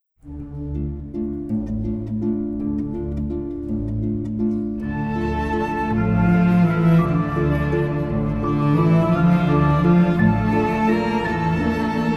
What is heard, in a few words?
second waltz